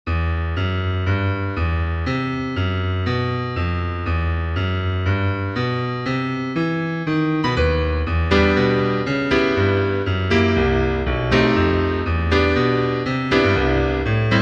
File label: Piano